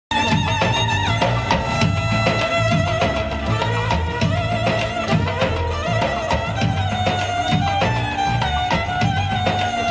Bellydance ikebukuro japan

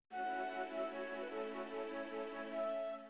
Ring Leitmotiv, mid

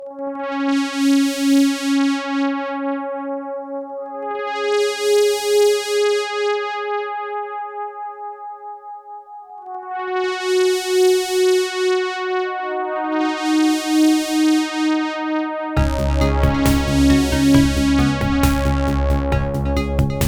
FM Comparison - Genuine Yamaha OPL FM vs. CQM

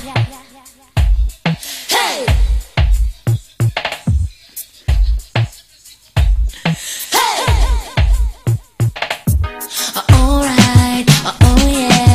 Techno Cumbia

